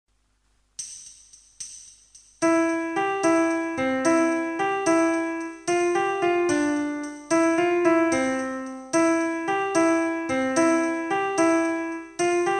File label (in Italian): intensità e altezza; esercizio; Sonora vol A